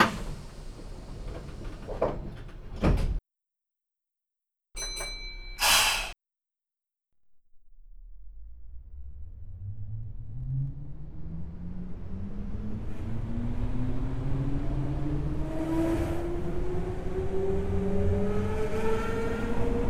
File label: motor noise; meitetsu 5300